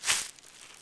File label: grass